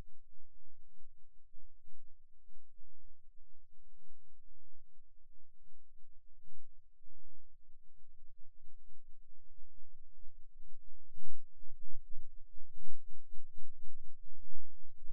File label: Rhythm Seizure, eeg